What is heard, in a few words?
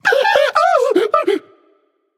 breath; smite; agni